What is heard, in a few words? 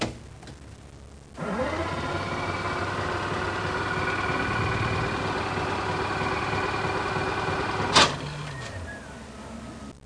boat, transpo sea; WAV Sound Effects